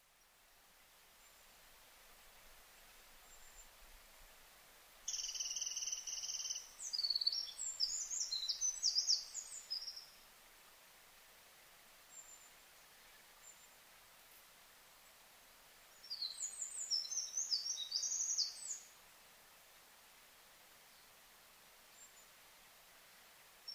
misosazai

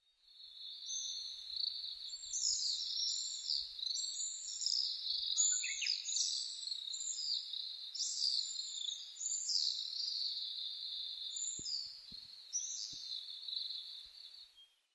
amatubame

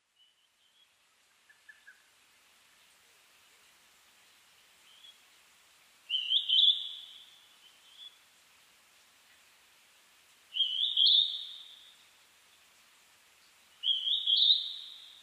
jyuuiti

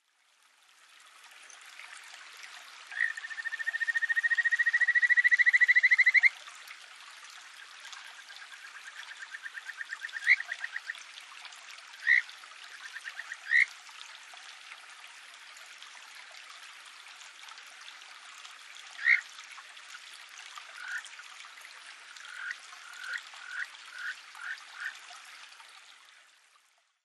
kajikagaeru

